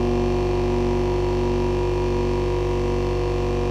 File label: substation hum, ambient; Counter Strike Model Pack; CS:GO; nuke